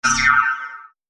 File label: Thin Alien Laser Sound; Sci-Fi; Andrew Fleming